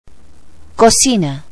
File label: stove; office